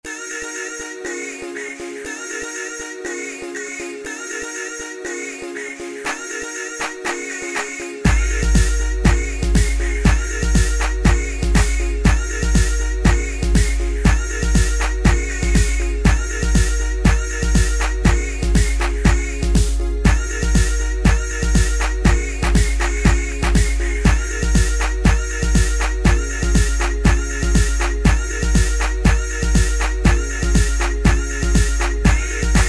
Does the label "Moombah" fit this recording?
Yes